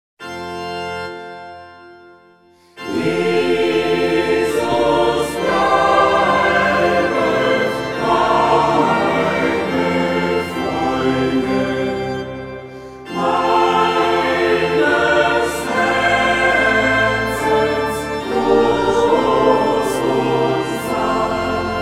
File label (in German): Chor